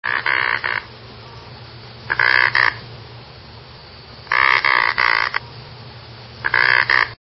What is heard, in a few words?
Anhinga, songs